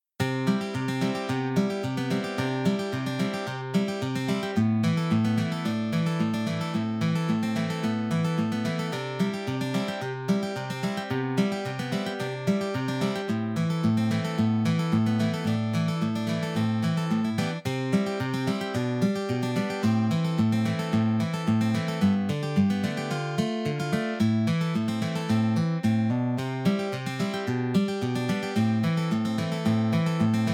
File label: strum; aas